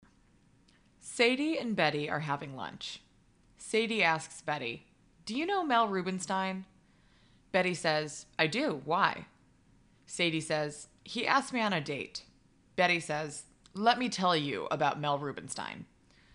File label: Laugh Track; Norm Ornstein; Joke